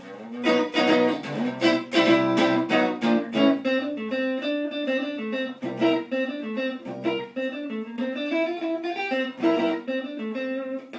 Guitar; Tom Jeri

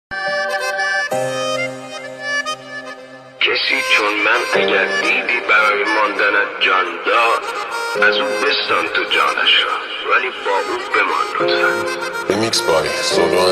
solo

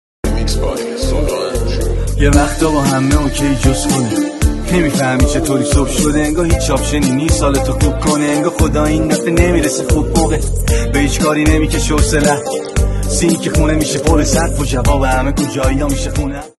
Solo

